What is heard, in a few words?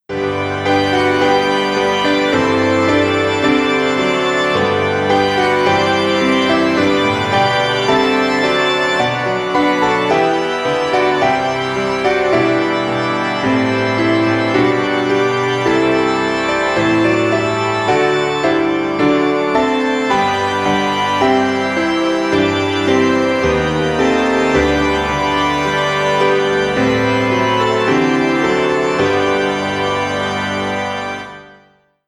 Piano Organ; PIANO & ORGAN DUET; Celebrating Grace